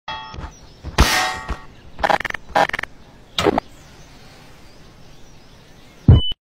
nuke sound effect; gmod

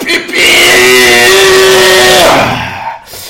wub woof wolf

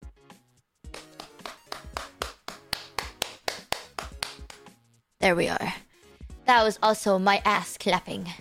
clap clap clap

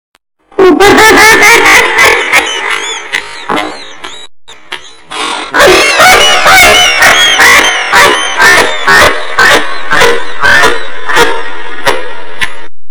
baby risa

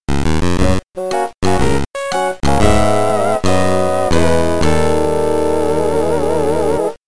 game over button

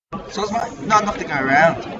ringtone

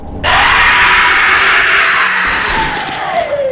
scare alert

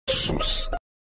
sus sound

